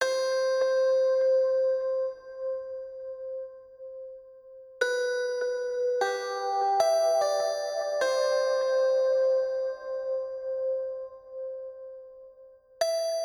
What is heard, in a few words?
Theme Crush Tone Keys from Omnisphere 2; Mirror's Edge Catalyst (2016); Spectrasonics